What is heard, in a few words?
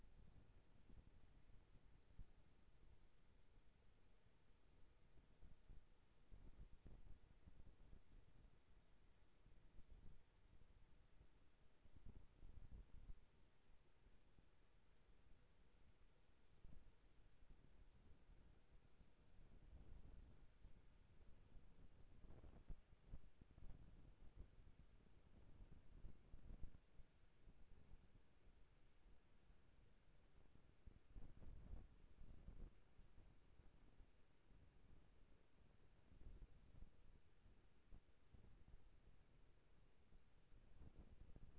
HYDROPHONE 16000Hz; JASCO AMAR HYDROPHONE 740